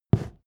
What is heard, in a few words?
step wood step